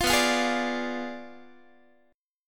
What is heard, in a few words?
B Diminished 7th B down Guitar; Bdim7 strummed